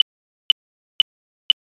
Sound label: clock tick